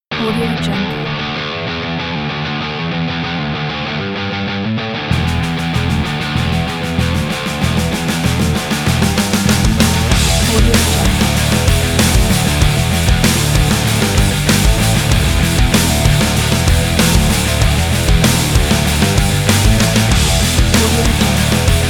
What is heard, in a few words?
powerful sport rock